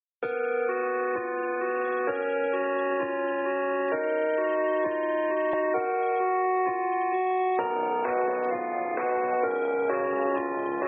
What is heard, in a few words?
sad music; cartel dealer